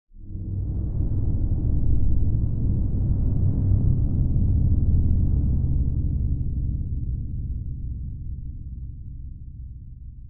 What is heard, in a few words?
suspense; DayZ-Epoch; dayz sfx